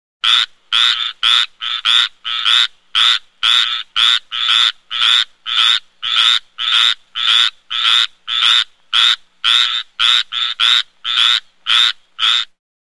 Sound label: Frog Tree Quacks